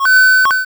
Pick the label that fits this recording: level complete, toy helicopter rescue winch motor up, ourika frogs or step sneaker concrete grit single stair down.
level complete